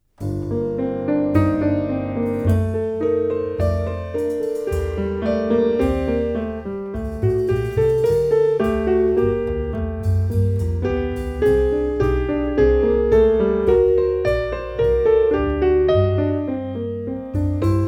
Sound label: Variations, for keyboard